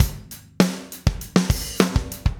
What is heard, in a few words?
Drums, Rock